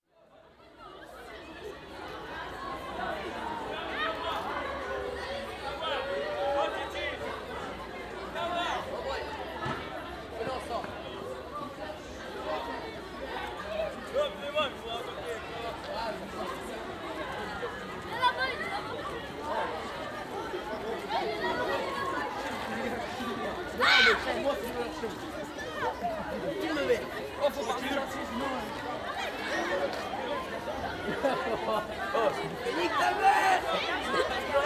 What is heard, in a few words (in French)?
La recre en exterieur; Metabole